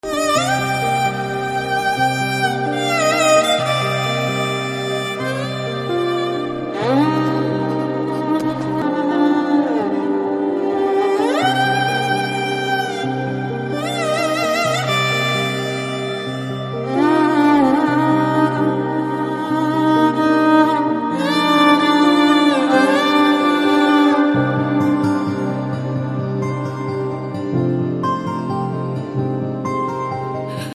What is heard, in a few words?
BGM Violin; Jaanu; Telugu